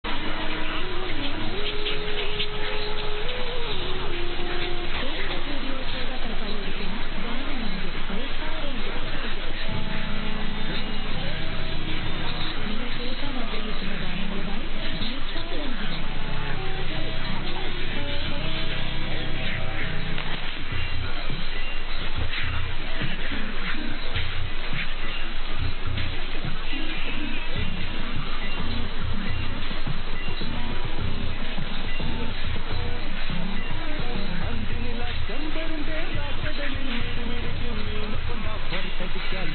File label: Asianet Radio UAE Malay